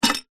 animals fish tank aquarium glass top set on rest on plastic brackets